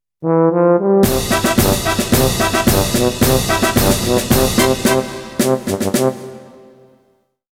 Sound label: Race Fanfare (Ranking Failure Time); dot hack; Puchiguso